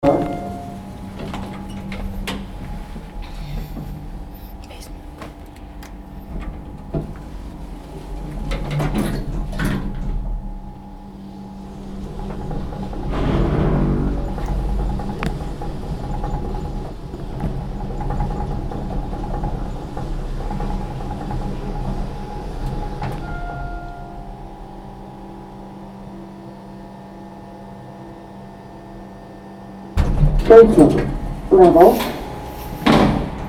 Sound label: elevator